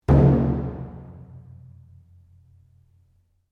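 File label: Drum Taiko Odaiko mezzo forte, Large Japanese Taiko Drum; Miscellaneous Musical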